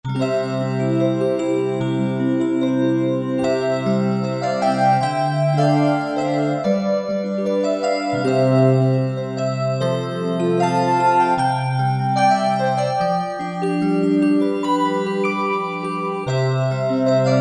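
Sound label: Star E Piano, Alesis Z3; Sanctuary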